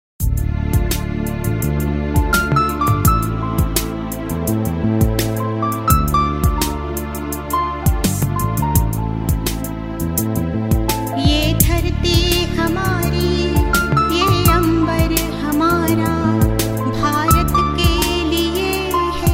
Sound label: female